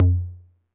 Tom Low 808 Sat, Saturated

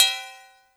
Ride Cymbal

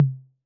Tom Mid C 808

